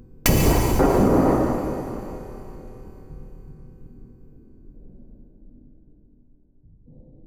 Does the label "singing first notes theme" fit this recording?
No